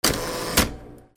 OFFICE

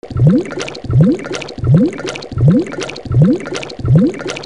TOYS

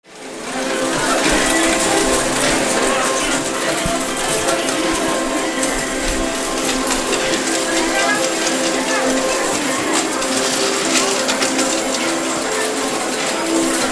CASINO

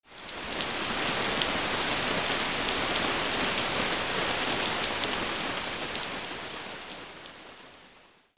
RAIN

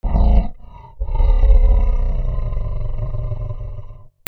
ANIMALS

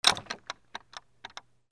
DOORS

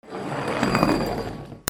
FRICTION

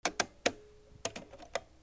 PHONES

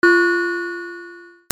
BELLS

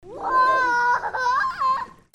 PEOPLE

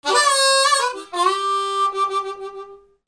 MUSICAL

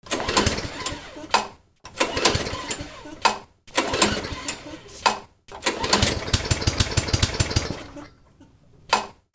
GARAGE

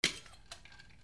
KITCHEN

